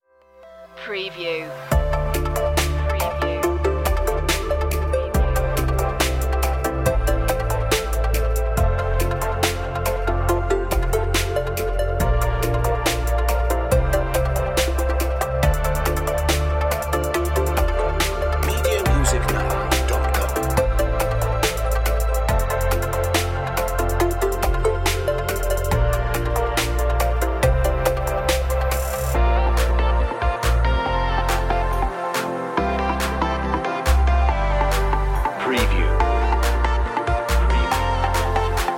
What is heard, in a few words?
glamour downtempo; enchanting